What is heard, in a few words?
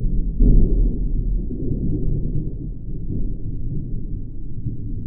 Weather, Thunder, Tropical, Distant Thunder, Rumbling Thunder